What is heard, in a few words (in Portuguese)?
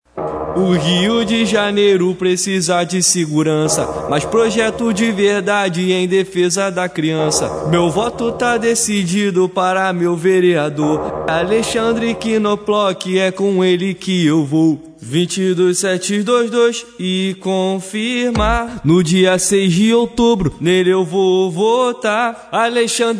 Funk; Vereador